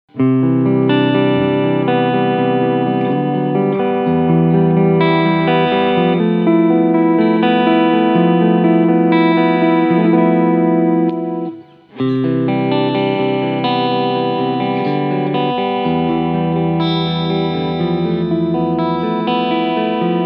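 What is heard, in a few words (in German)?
Music Man Sabre Clean Sound alle Pickups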